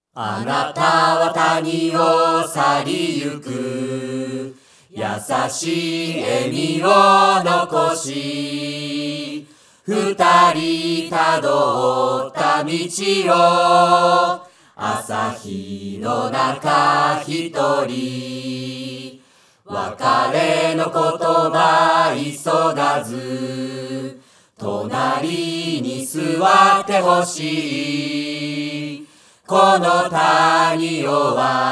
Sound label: Red River Valley (Choir); From Up on Poppy Hill Soundtrack